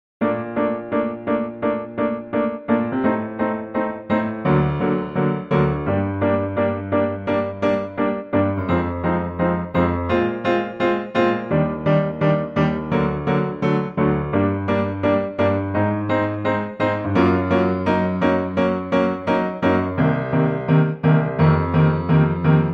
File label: piano